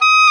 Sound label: WND OBOE Dry; Roland LCDP04 Orchestral Winds; Oboe 4-8; Oboe Dry 6